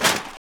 Rustle; Ed Edd n Eddy; Adromulus Pack

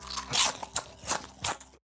Eating - Potato Chips; Ed Edd n Eddy; Adromulus Pack

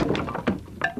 Wood; Ed Edd n Eddy; Adromulus Pack

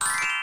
sparkle

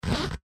zip

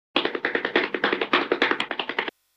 Cartoon run

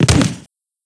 Body Impact

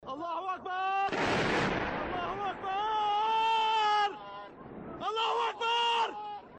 Allahu Akbar shouts

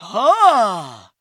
Laugh Hahhh; ED; Ed Edd n Eddy; Adromulus Pack; EEnE

